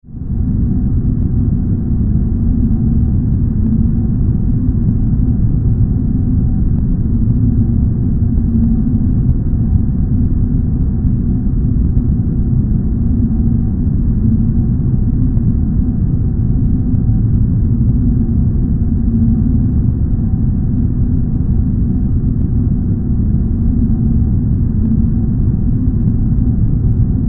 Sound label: Drone Wind Alien, Drone, Wind, Alien, Planet, Steady, Subtle; 5.1; BlastWave